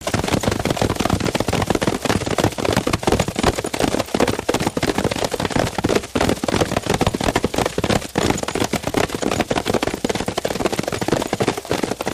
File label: Group Horse Gallop To Stop, Foley Effects, Some Bridle Jingle